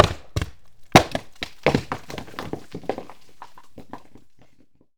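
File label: rock slide; RockRip Blender; SFX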